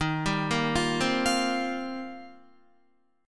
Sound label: Eb 6th Add 9th, Guitar; Eb6add9 arpeggiated; 10,10,10,10,12,13; JGuitar